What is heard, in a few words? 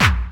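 snare drum bass drum, Dance Mega Drums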